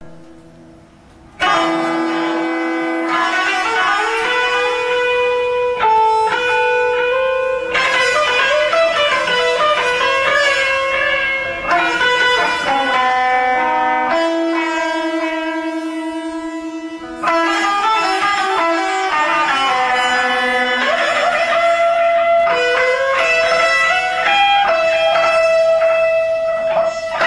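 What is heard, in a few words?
24 equal temperament guitar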